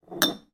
foley bottles x2 beer empty pick up from table slight distance; zapsplat